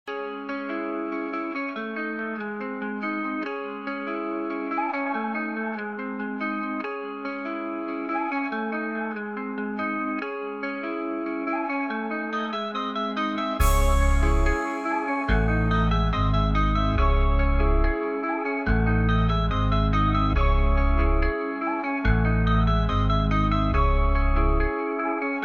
beat

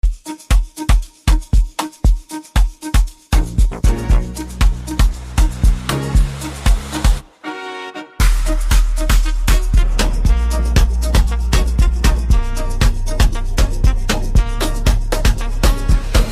beat

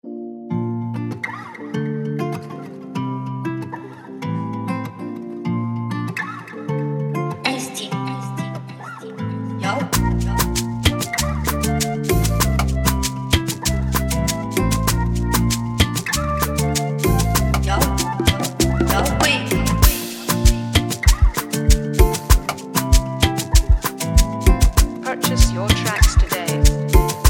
FREEBEAT

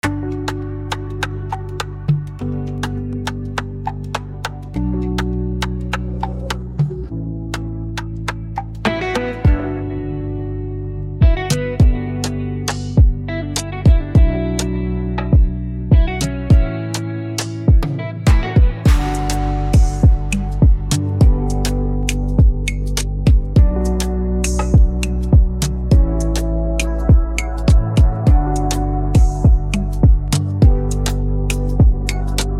BEAT

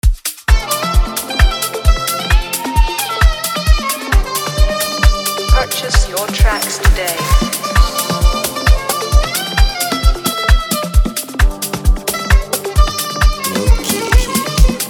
113BPM

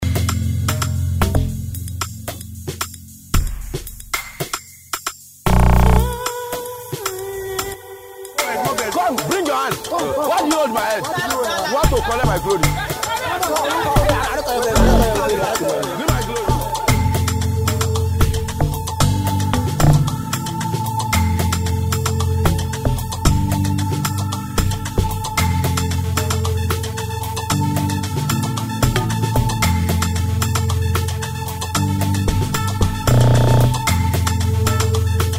Amapiano Instrumental

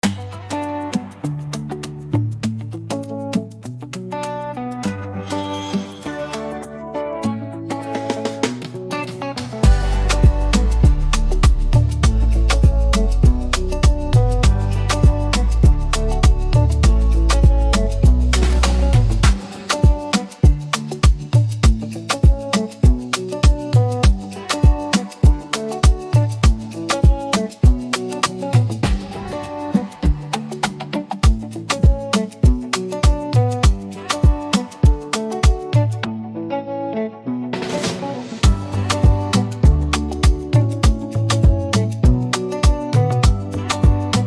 BEAT

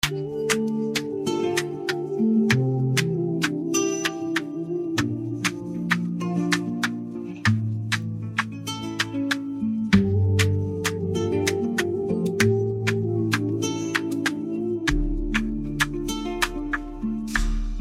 BEAT

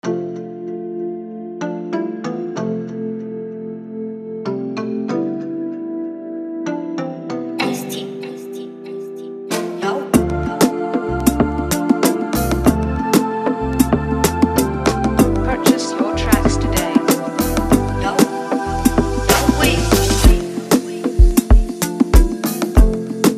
Dark FREEBEAT

